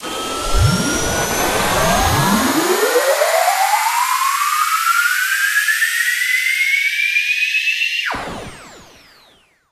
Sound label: potato spin; Source Engine; Portal 2